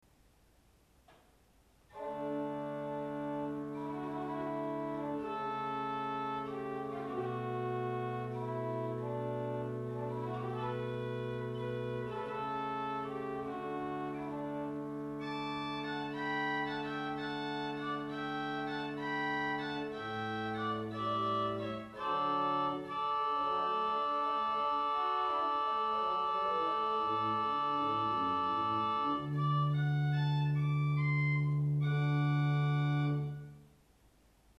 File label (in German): Gambe 8, Orgelstimmen; Orgelstimmen CD1